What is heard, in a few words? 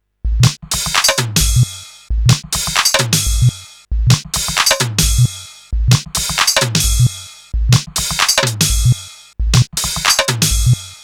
stereo beats loop